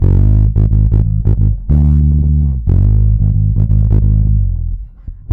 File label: G#, bass; Spectrasonics; Bass Legends; 90 S.BRONX